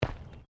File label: FOOTSTEPS SNEAKERS PLASTIC GROUND MAT STEP; Footstep Foley; Footsteps (Sound Effects:Human)